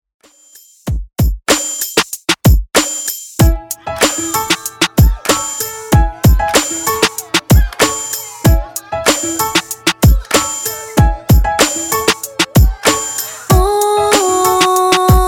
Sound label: Top40 Redrum 95bpm